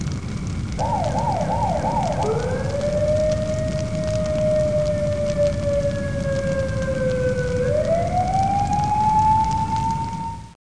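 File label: fire alarm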